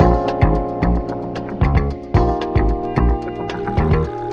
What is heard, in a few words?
keys, phonky loops